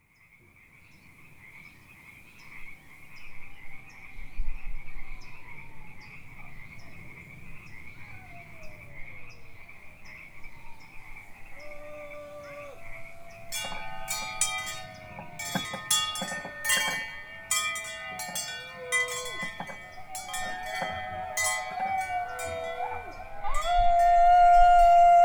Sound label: Howl